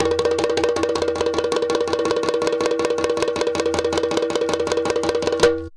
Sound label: sabar groove; Best Service; World Colours